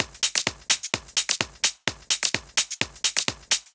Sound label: Loop, 128 BPM